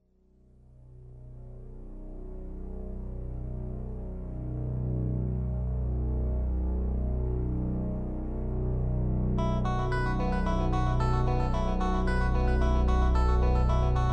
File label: instrumental